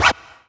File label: Roland SC-88 Scratch Push